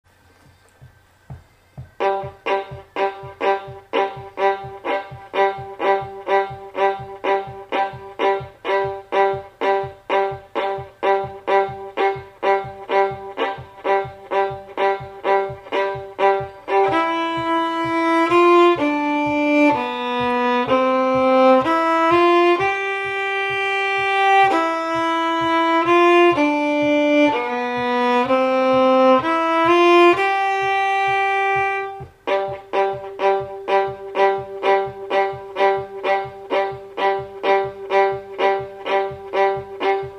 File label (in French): Polka 2 - 2eme accompagnement, violon